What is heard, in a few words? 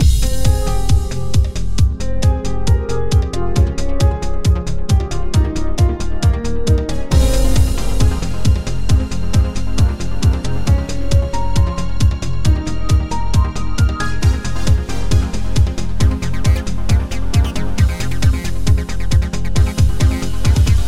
GuitarMusic